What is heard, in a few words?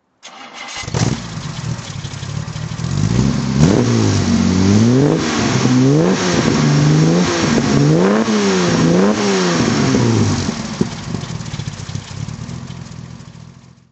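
Jaguar E-type s1ots 38 throttle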